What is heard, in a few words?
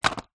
office chalk sticks in plastic container set down on table; zapsplat